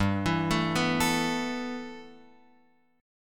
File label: G 6th Add 9th, Guitar, Open F; G6add9 arpeggiated